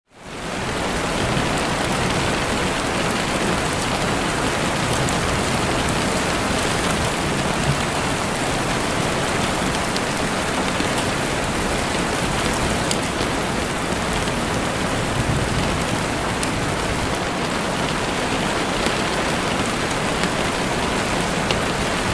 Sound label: rain sound; 30 دقیقه بارون شدید